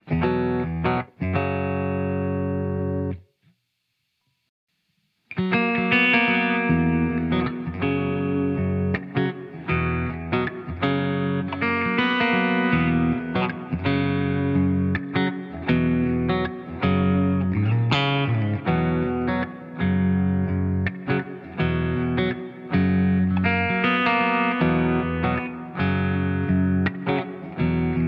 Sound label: Warm Dirty Mod